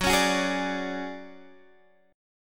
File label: D Major 7th Flat 5th, chord